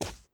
Foot carpet Sprint; We Happy Few

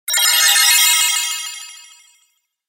Casino Roulette Win; Mist Train Girls

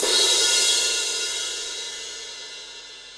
Crash; Torchlight III; PS4

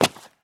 Character Landing After Jump Outside Court

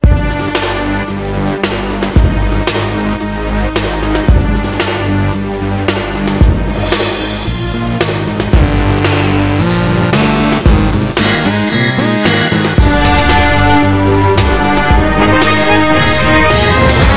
Head Quarters Music; brotherhood united; ps vita; ps4

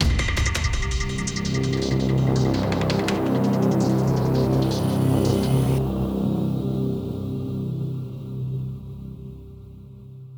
Dramatic Mood

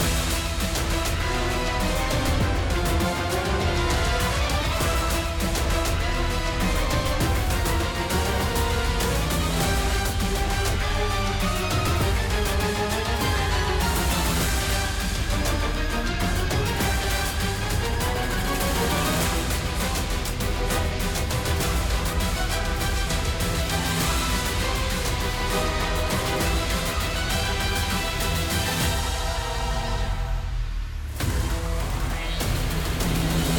Battle Boss Subway Phase

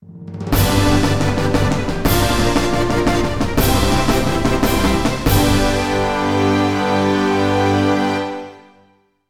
Jingles Fanfare; gamerip; Selenophobia

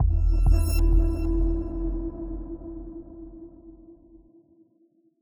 Fight Fight End

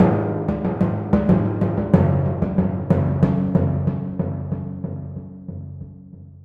Timp G sharp F sharp; torchlight iii; ps4

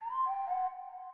Baby Chime; Swan; Lullaby Days

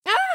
build idle; talking tom gold run gamerip 2016; Becca

